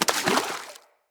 Short Sword Medium Impact Water

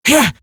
pain, female; en; Eximus Grineer; Battle Barks

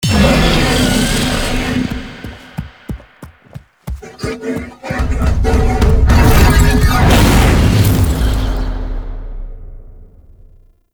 Mounted Intro; Dax; Warframe; Duviri

